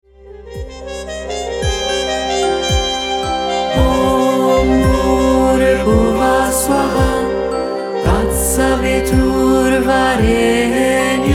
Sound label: Gayatri